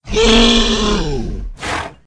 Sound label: yak